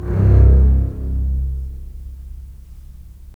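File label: STR, strings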